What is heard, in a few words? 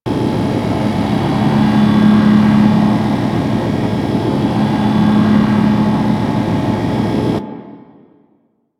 Gas Storage Container Loop; Ambience; Warframe; Corpus Gas City